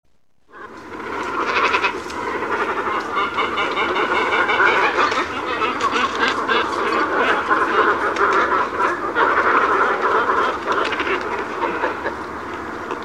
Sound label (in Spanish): cormoran grande